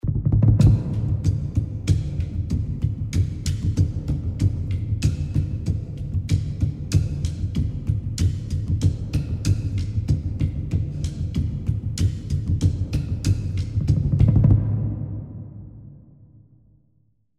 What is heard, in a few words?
Drum C